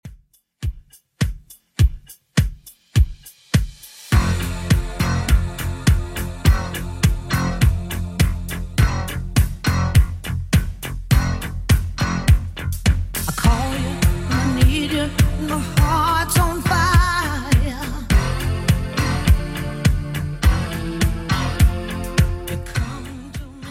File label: 80s Redrum 103bpm